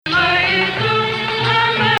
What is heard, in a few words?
Sikah Jadaka Hijaz